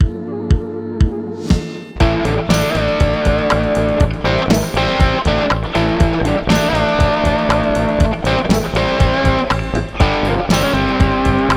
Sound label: hard rocking casio beat; weeklybeats 2024